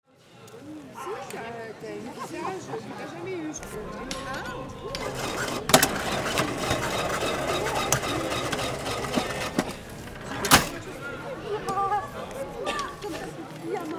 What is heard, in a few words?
Market ambience voices and money exchaning